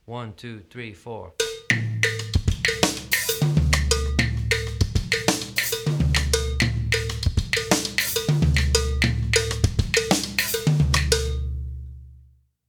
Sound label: songo variation